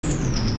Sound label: Door Mechanical Close; zelda; OOT